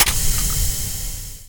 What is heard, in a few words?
railgun vent